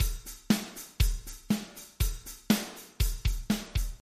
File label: Drums clean; Ударные чистые; Компрессия. Основы компрессии звука